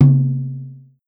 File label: Tom High; Forzee